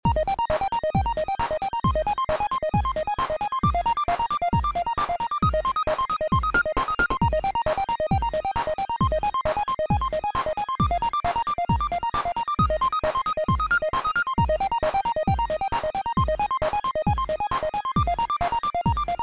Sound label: bonus level; CPA Skill Check